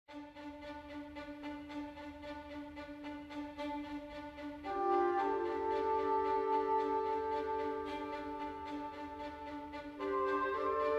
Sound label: World Music; Jurassic World Evolution 2; PS4 PS5 Windows Xbox One Xbox Series X/S